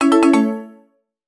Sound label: app alert tone